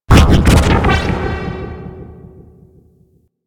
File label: Antimatter Worm Hole Exit; Warframe; Warframes